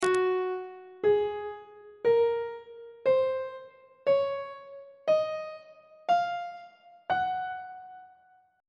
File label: g flat lydian mode on treble clef